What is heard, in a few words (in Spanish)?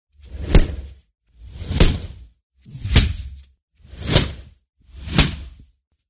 golpe pelea